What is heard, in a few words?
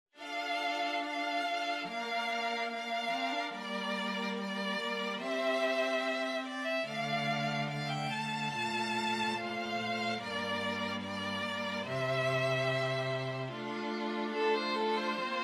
STRING ORCHESTRA